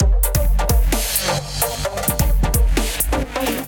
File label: Big Room Kit groove 130bpm